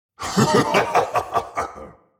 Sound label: Demon king lord cackle